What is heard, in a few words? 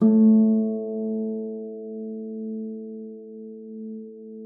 FLAG G#3, harp; Xsample VOL-8; HARP+FX